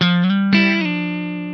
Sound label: INTERVAL, Funk Guitar; Zero G - Funk Guitar